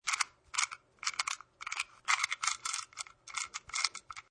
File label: Lock